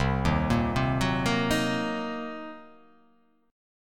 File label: C Minor 7th Flat 5th, chord